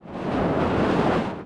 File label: wind